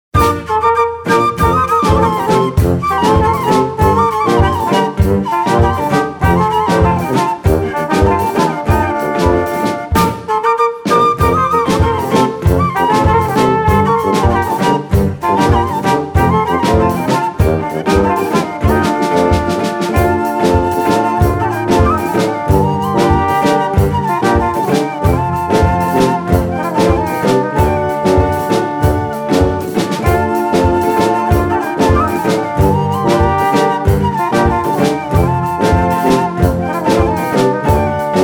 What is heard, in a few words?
serbian quintet